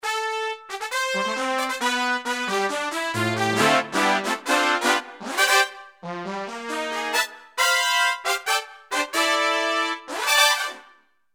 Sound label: brass, roland jv2080